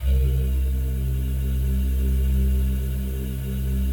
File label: DIGI PAD